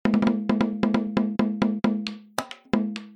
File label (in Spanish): TAMBORA; multitracks